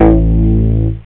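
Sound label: hit bass drum; jazz jackrabbit 1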